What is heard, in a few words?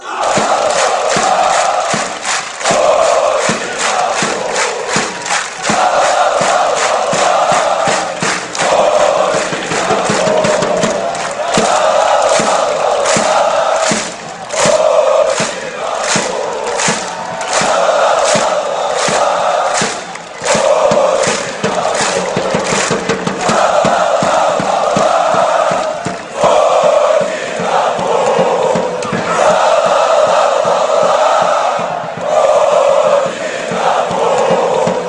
kyiv fanchants